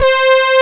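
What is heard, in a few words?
analog brass C4, analog synth; AKAI S6000 CD-ROM - Volume 1; SAMPLE WAVE MIXING 1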